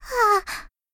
Voice Breathing

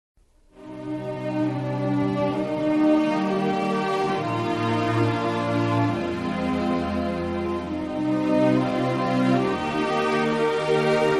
last fight

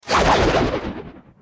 moon Slash

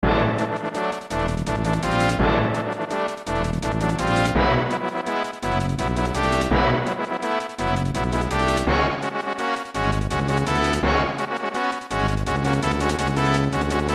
knight BGM; KnigTeam

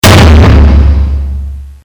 Peng

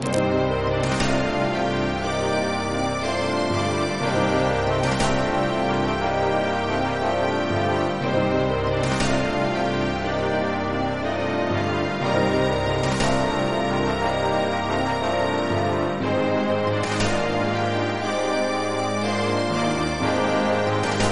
bgm

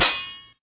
block

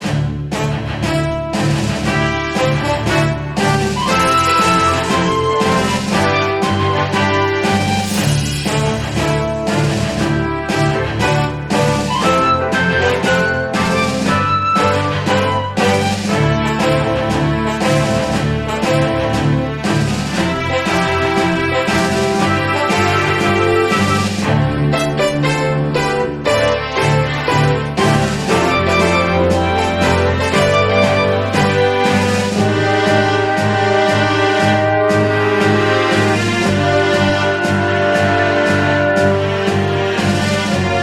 Bgm Fantasy

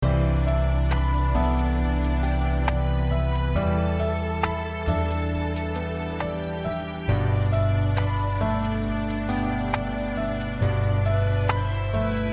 bgm

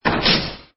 pickaxe

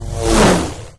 airplane select; Pacificwar

